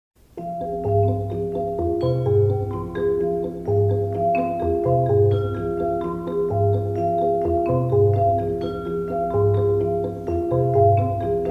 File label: Dulcimer